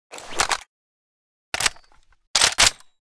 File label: an94 reload; STALZONE